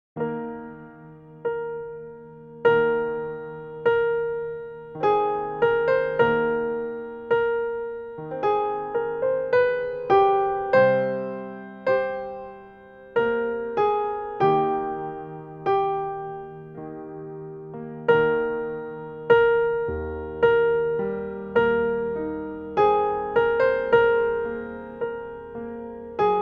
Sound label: harmony